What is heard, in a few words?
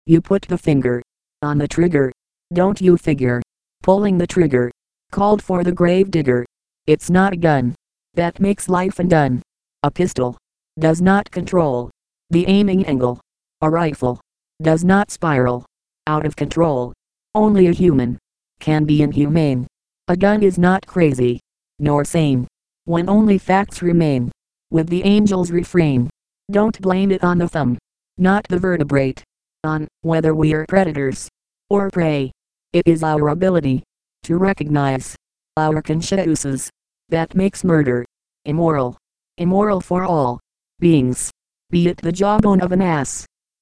trigger voice synthesizer